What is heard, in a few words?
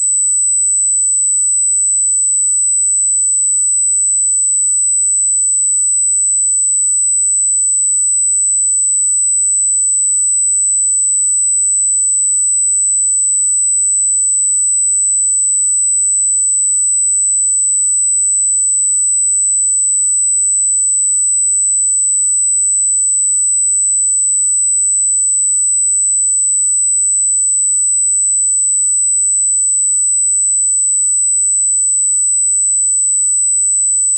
Sine 8kHz